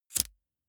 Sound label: lighter wheel spin flame burst